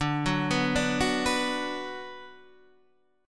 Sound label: D 6th D down Guitar Half Step Down, chord